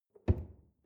drawer large close lock

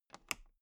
toolbox latch close loose soft

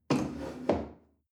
chair wood place slide soft

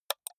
computer mouse left click single hard

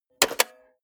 cassette player slide tray take out hard

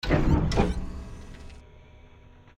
electric door

